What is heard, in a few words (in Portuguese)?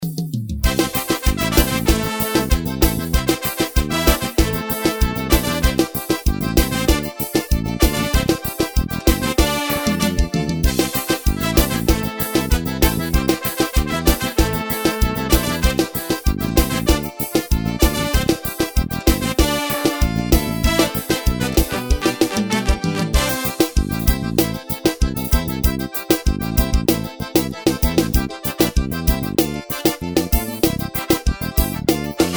eletroforró playback